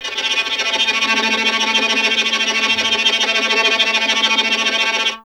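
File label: string violin, Vln Trem; Miroslav Vitous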